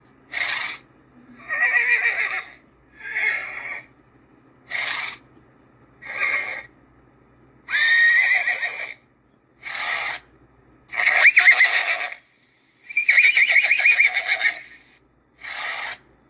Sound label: Horse